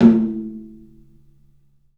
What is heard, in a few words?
TOM, 3D Drums, CLUB TOM; 3D Sound; CLUB 08TOM